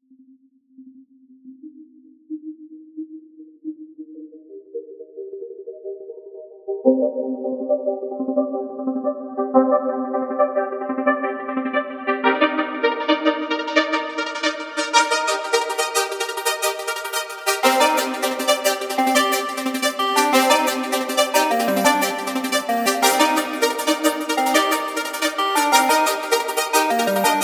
trance

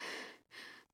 Pant; Lewd SFX

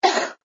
Cough; Lewd SFX

